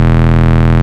Root E, Bass

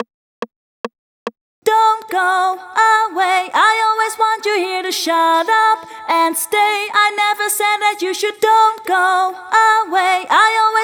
Chorus (Solo) FX, 142 BPM; Vengeance Samples; Hello You

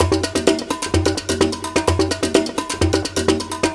Latin House Loop 128 BPM; Vengeance Samples

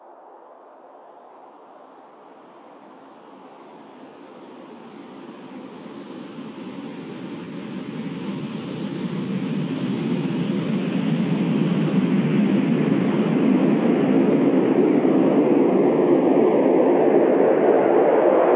Sweeps Long

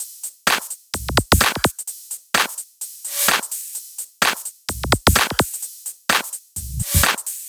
128BPM Kit; Vengeance Samples; VFH2 128BPM Cool Kit

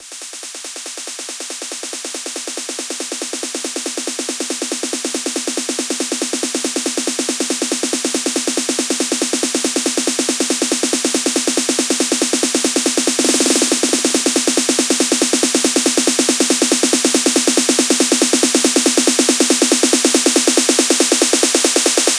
140BPM SNARE 16 Bars; Precious; Vengeance Samples; Snares&FX Pairs

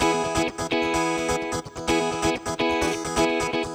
Electric Guitar 128BPM E maj; Vengeance Samples; Electric Guitar Kit 1

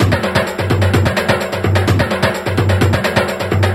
Percussive Loops 128BPM; Vengeance Samples

